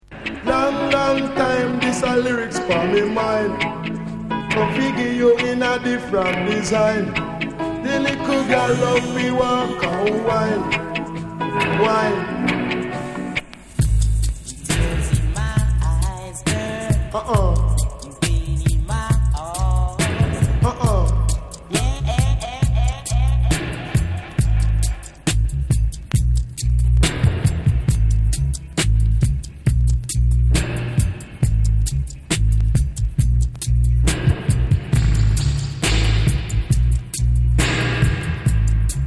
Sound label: Explosion Dub; Burning Sounds